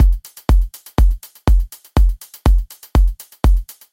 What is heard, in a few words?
122 BPM Battery Full Drum Essentials Pro; full drum kit